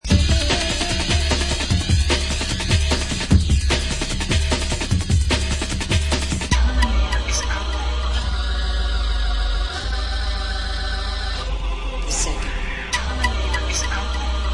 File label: Drum Bass